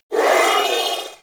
Sentient Symbilyst Pain, Barks, Enemies; Warframe

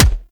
Punch, weapons; Warframe; Scaldra AK

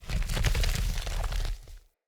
Stone Move Large Start; Puzzles

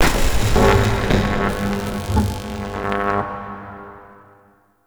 Electricity Spark; Ambience; Warframe; Entrati

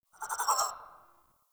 Tree Critters Angry; Warframe; Duviri; Ambience

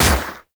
Shot, Akimbo Pistol; Grineer; Prisma Twin Gremlins; Weapons

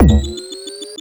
Alt Proj Pre Explosion; Crp BE Plinx; Blastor Pistol

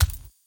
Steps; Warframe; Characters; Kalymos

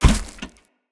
Step Vegetation, Footsteps; Characters; Enemy; Mendicant; MndKing

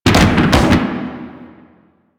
Motorcycle Slide Boost Backfire; Vehicles; Warframe; Motorcycle Ninety Nine

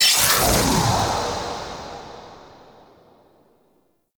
Katana Invisibility Activate; Warframe; Excalibur Umbra

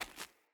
Walk Stone, Footsteps; Characters; Mother

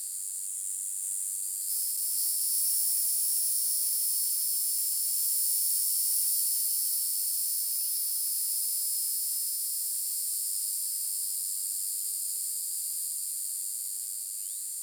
Insects High; Ambience; Props; Warframe; Eidolon Plains

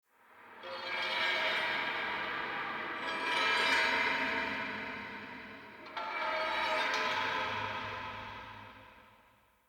Warrior Echo, Ambience Crypt; Champion Crypt

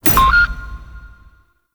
Interior Orb Station Console Select; Warframe; Tau; Orbital Station

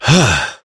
Breath Relieved Adult; New War; Adult Operator

